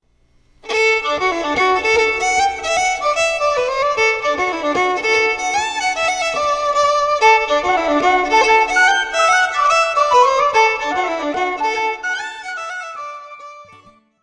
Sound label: Whistles, Finnish Polka; Dennis Murphy's Slide